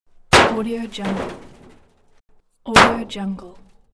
kick on car hood

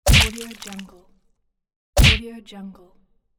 punch with flesh splatter

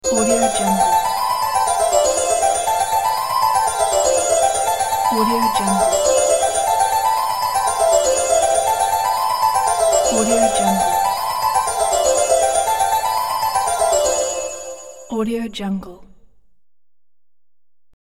fairytale kingdom interlude

